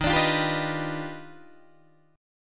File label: D sharp Augmented Major 9th D sharp Guitar Open D